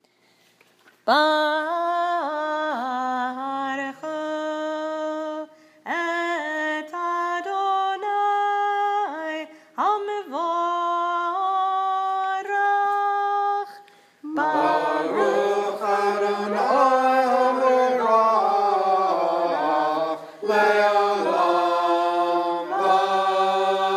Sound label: Barchu